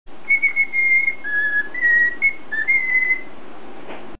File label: cockatiel sings victory fanfare